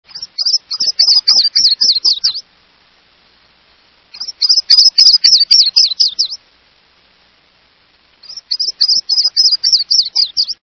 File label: Yellow throated Warbler